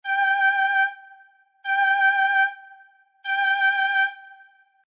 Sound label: strings